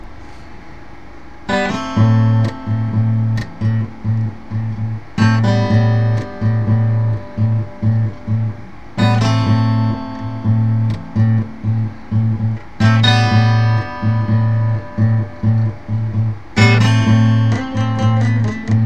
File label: Somber Guitar Song ECHO